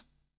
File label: COPS STK; Spectrasonics - Backbeat